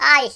ooff; Worms Armageddon; Finnish

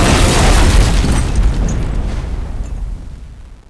tank death; 1997 MMX LAN PACK (win 95); Army Men

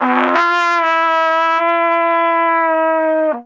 Silly trumpet, Fanfare; 1997 MMX LAN PACK (win 95); Worms Armageddon

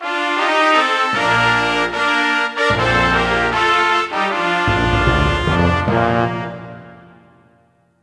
Argentina, Fanfare; 1997 MMX LAN PACK (win 95); Worms Armageddon

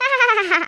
laugh, Kidz; 1997 MMX LAN PACK (win 95); Worms Armageddon

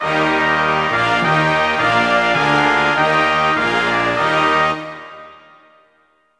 Germany, Fanfare; 1997 MMX LAN PACK (win 95); Worms Armageddon

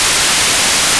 m80 sizzle; 1997 MMX LAN PACK (win 95); Army Men

